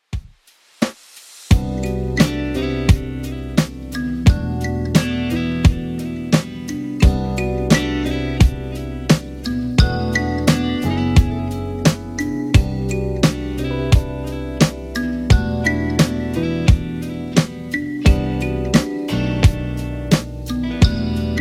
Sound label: 70s Redrum 87bpm CLEAN